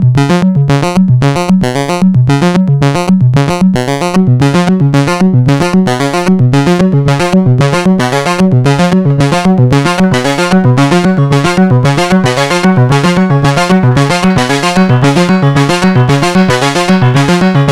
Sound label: Rossum Electro Music Panharmonium as Oscillator Add Voices